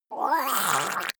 monster gurgling high; kreaturen

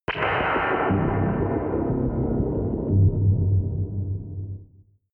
synth hit whip fall; whooshes rises; hits impacts

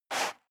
step high heels sand slide short; schritte

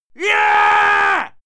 male fight scream yeah; menschen; schreie

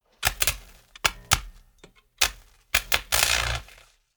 spark; comedy cartoon; elektronisch

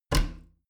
bathroom cabinet both close regular

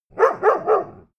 bark three times, hunde; natur-tiere

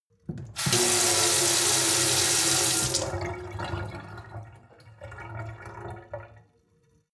sink water flow hard; industrie-wirtschaft; gastronomie

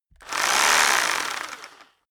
rock drill hammer rev long; maschinen; bohrer; soundpool

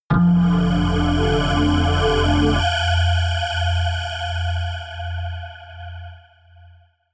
synth hit steam; whooshes rises

